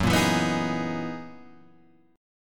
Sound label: F sharp 13th F sharp Guitar Standard